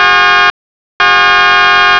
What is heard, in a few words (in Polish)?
sound, sygnalow elektrycznych 12V; VEGA DUAL; stal nierdzewna; 41 i 47,5 cm